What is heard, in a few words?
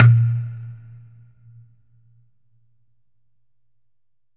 COCONUT WET